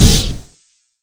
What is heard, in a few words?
Electro